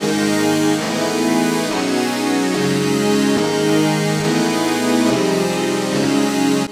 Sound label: Synth Progbuild; Sonic Foundry (Sony Creative Software); Knutrix Drum & Bass